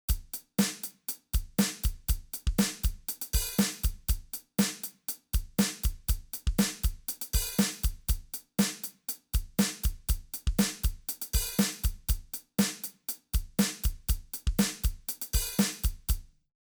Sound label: Drum Machine Sample